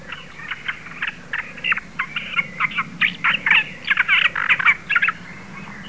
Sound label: Hippolais olivetorum